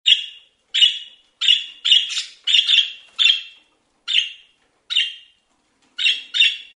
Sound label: budgie chirps